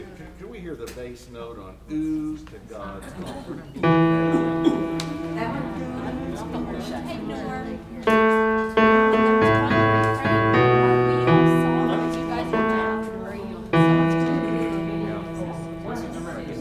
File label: bass